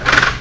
Sound curtain; Heidi's House

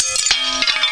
Breaking Glass

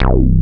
minimoog; 16bitsamps

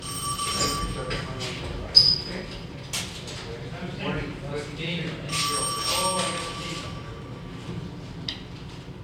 Office w Ring, Ambience Inside; The Hollywood Edge

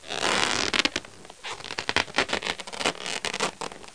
squek

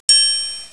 cling; MOBICLIC; sciences

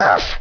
Ooff; Danish

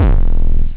terror, drums techno; OctaMED SoundStudio V1

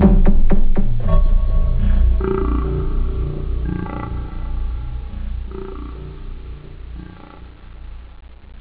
vocode; OctaMED SoundStudio V1

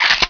reload pistol; SpecOps2 MPlayer Demo

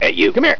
DEMON

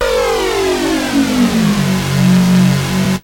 down swp 1, synths dance rap

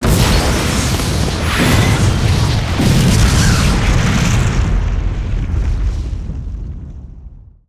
Biggest Explosion; 1944 Battle Of The Bulge

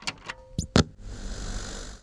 television

